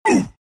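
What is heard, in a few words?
pain, Russian; dota2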